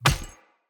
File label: Death Default; Enemy; Sinecure; Melee Grunt; Death Effects